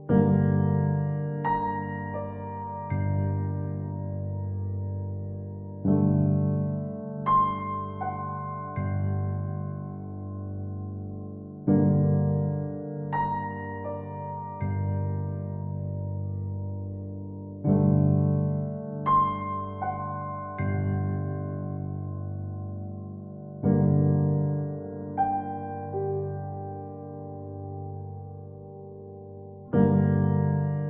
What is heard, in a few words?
Music Minor; Hallway Music Test